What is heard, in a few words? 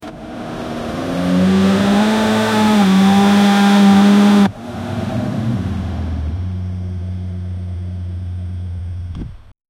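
auto wheels driving slowly, road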